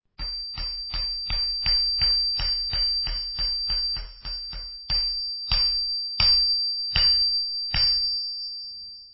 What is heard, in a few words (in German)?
amboss